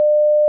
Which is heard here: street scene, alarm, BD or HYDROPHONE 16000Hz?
alarm